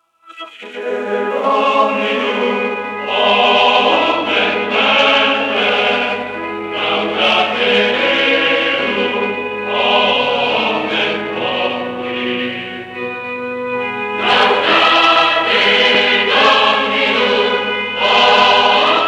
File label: laudate, Chorale; la chorale du petit seminaire de Paris